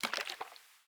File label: water bottle slosh; Rust